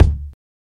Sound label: strong bottom end bass drum D key; kick